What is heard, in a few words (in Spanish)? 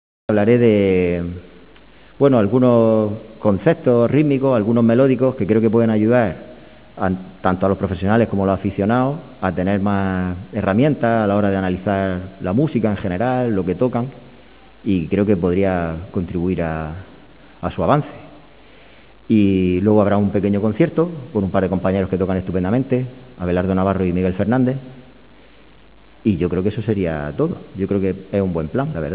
Guitarras